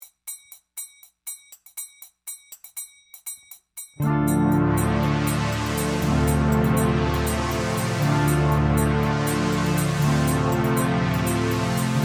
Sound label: raga drums, instrumentals